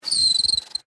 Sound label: Bicycle Brake, Squeal, Schwinn, Vintage; Sports; Bicycles; BlastWave